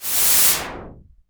cinematic impact wipe

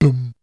Beatbox; ZERO G - Datafile DISC 3

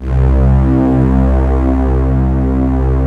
ANLG STR, ANALOG STR

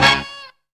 SPINNER HIT; Zero-G; Funky Elements

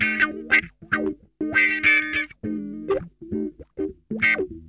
MUNCH WAH, Funk Guitar; Zero G - Funk Guitar

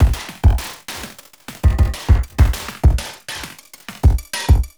Beat, Industrial

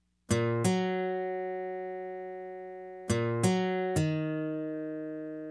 Hip Hop and R&B Drumloops; Best Service ProSamples vol.01; AKAI

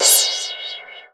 HOUSE CRASH, Drums Dance & Groove; USB Soundscan vol.11; AKAI; HOUSE KIT

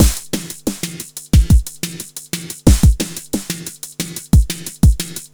tronix noise, 90bpm

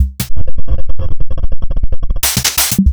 Fricke MFB 502 Kit

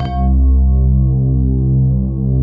VIBRA STRNGS, pads; Pro-Rec - Synthsonic

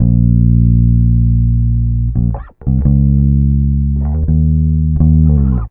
Bass, Trip-Hop Loops; Sonic Foundry (Sony Creative Software); Rondo Brothers Trip-Hop Loops & Samples; Hard Rocks The Roof

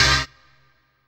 HOUSE, House Dance

